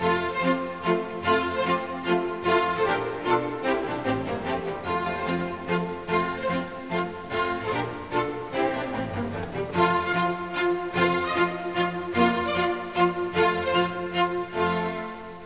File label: Sound Loop